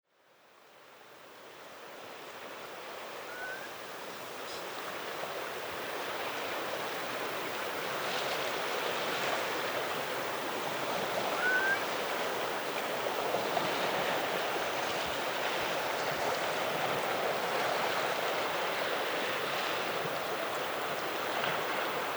Evening birdsong

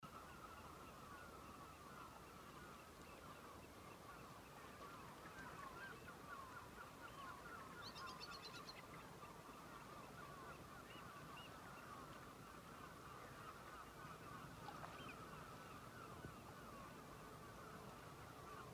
Galloway Sunset

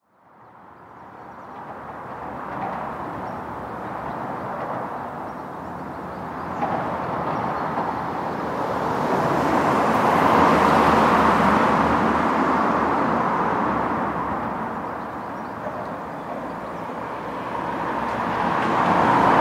Colwyn Bay Soundscape

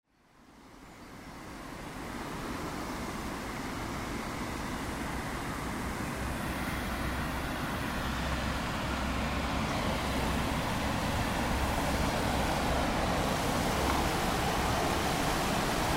Lakeside Ambience

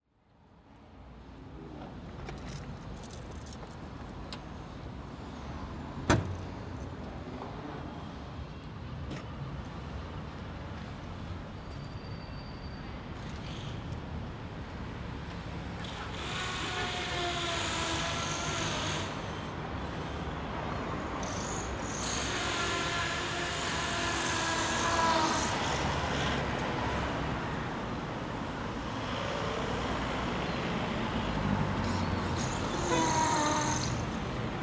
Street Walk Soundscape

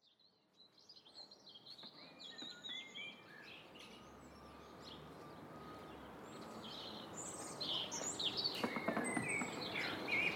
York Park and River Soundscape

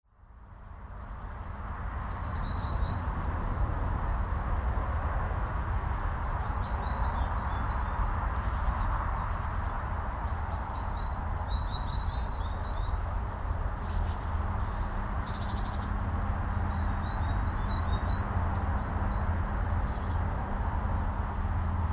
Stainton Woods Soundscape

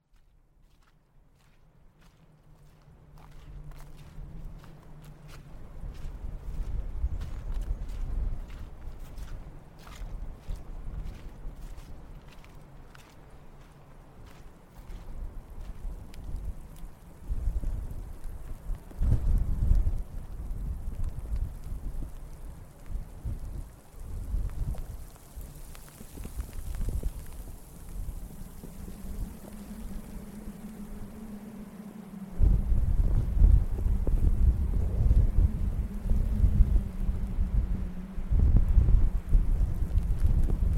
Mynydd Gelli Soundscape

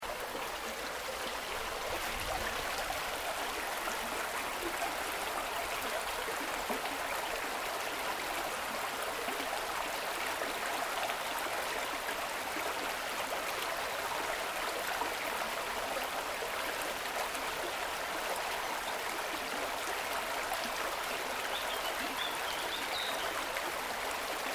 River Sound

